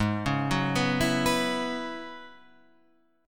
F sharp 7th Suspended 2nd Suspended 4th, G sharp

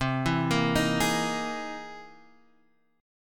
C 7th Sharp 5th Sharp 9th C

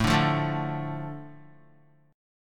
A Suspended 4th Sharp 5th down Guitar; Asus4#5 strummed; JGuitar

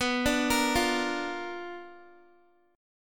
B Minor Major 7th Flat 5th Guitar

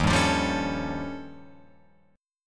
Eb 9th Flat 5th, Guitar Open F; Eb9b5; strummed; JGuitar

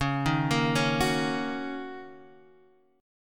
Gb 6th Db

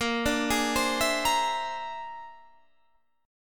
Bb 7th Sharp 11th Bb; arpeggiated; Bb7#11

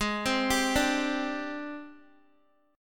Ab Major 7th Flat 5th Ab, down, Guitar; arpeggiated; JGuitar

